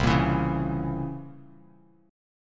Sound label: C 7th Flat 9th C down Bass Standard; C7b9 strummed; JGuitar